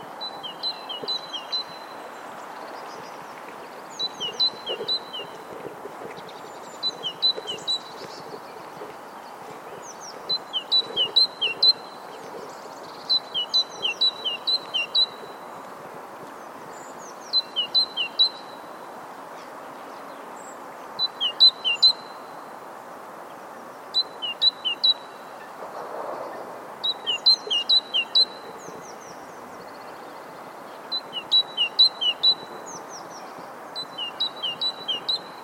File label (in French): mesange charbonniere